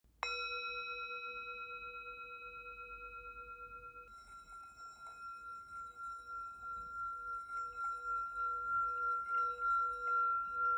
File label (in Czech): tibetska misa; non mala